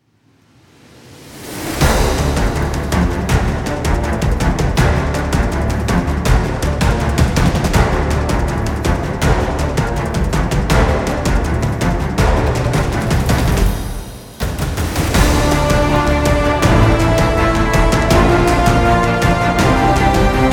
Epic Action

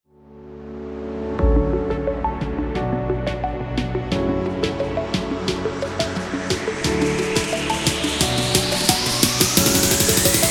Dynamic Sport Future Bass

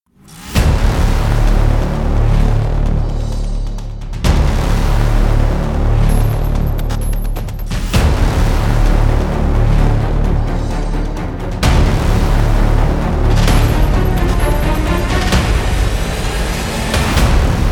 Cinematic Logo

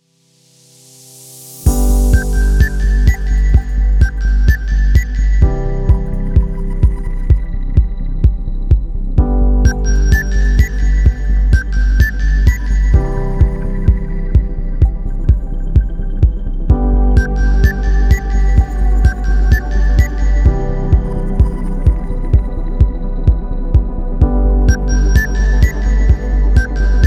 Bells

